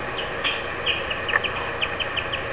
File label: chirp